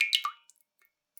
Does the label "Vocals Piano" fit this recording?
No